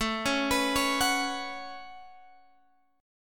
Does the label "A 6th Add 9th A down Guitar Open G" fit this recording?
Yes